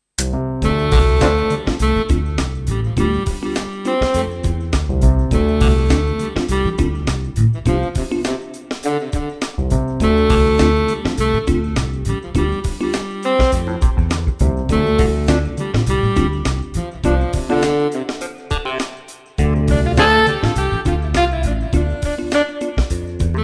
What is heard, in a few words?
jazz